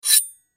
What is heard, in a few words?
Knife Pull From Sheath Out Metal Scrape Ring; Warfare; Knives